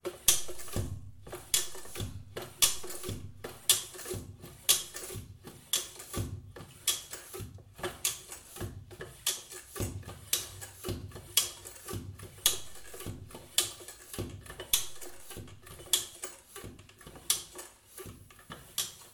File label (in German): fahrrad luftaufpumpen